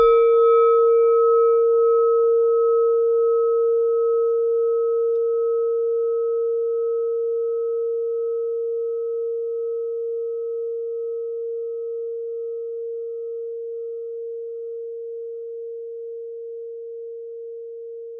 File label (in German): klangschale nepal, Klangschalen Nepal